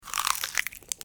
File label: Crunch